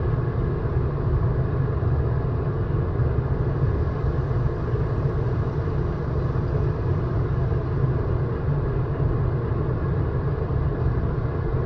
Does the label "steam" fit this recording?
Yes